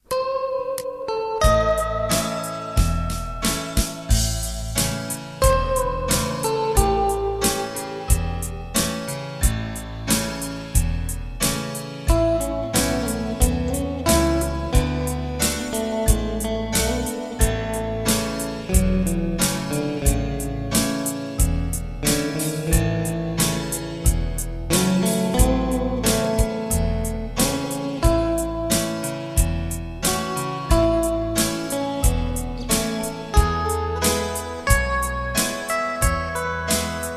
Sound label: Bendy guitar